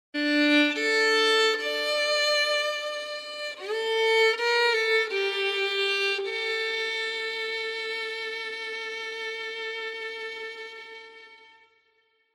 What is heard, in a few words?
Violin Documentary Drama